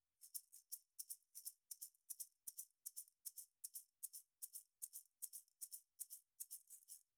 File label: Shaker; Soundeluxe UI95